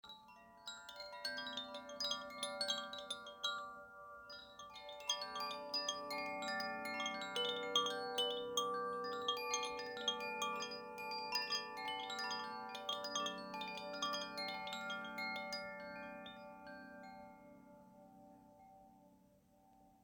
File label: koshi aqua ignis, carillons Koshi; carillon koshi feu; tresors esoteriques